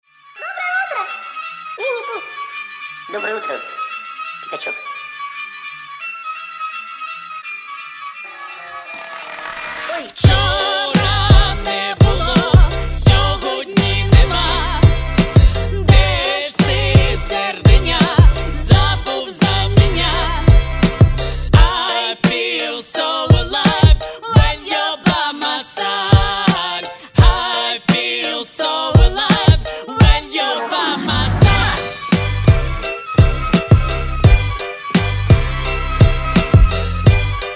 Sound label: Blues